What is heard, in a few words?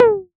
Tom MKS, Drums